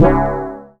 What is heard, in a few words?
C#, BELL GONG, Synclav FM; Big Fish Audio